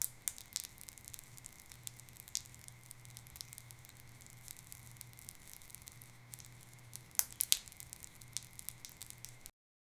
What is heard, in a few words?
flipping pancakes mid air